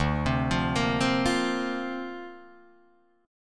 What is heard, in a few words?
D-Minor 7th Sharp 5th, D, Guitar, Drop D